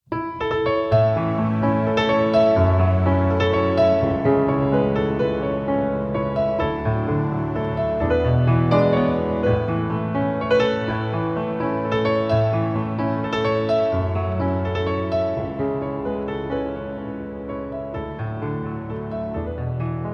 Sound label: Waltz; Kates